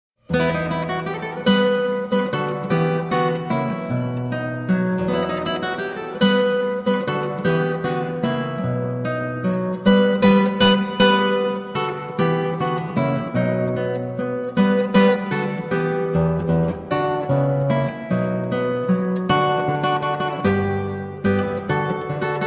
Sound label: Salterello, Lute; Traditorella; Casteliono Lute Book 1536; Pietro Paulo Borrono